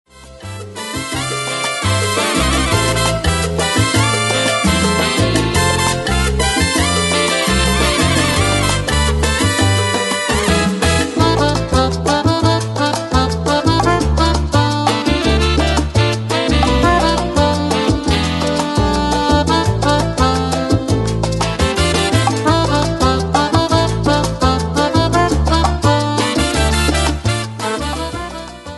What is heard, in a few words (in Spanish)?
Club latino